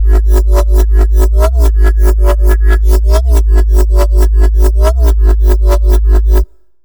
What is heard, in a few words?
Bassline C3 140, Dubstep; GhostHack